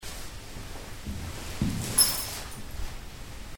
Citadel Noises, These curious noises were picked up by two of our record; Alcatraz Island; Alcatraz Island (November 2007); zoom